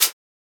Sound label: moving cursor